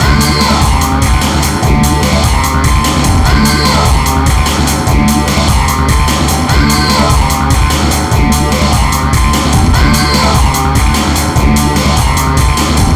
Hardcore Breakbeat, 148 Dmaj; Big Fish Audio; Hardcore Breakbeat Action; Get Up

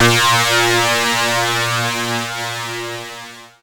PLUCKED, Synclav FM, A#; Big Fish Audio

